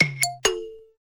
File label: nutcracker ringtone, apple sms ringtones